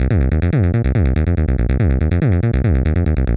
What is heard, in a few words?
acid 303 squelch loop 142 bpm; 2 bars